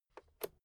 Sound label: fader single slide click